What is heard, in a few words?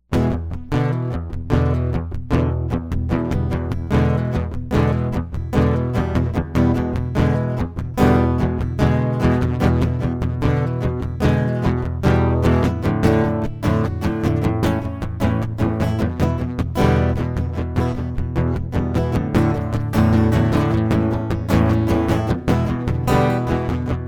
acoustic